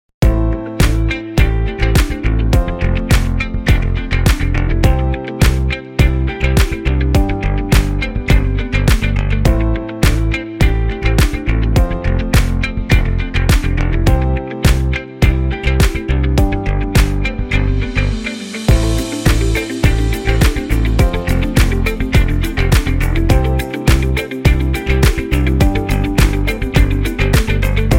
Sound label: Corporate Funk